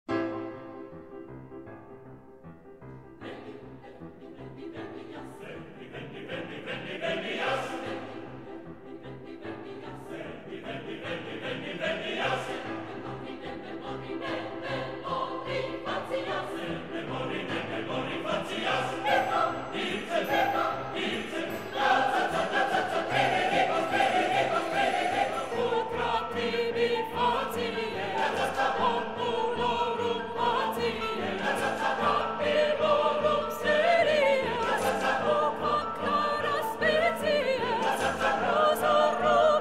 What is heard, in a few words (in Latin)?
Veni veni venias Coro 1 Soprano